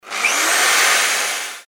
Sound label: drill